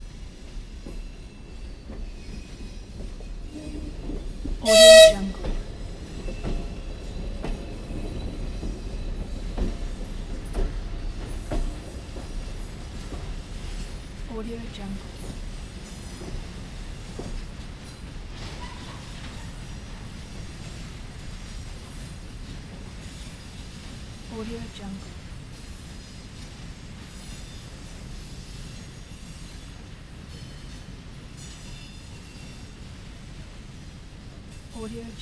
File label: train whistle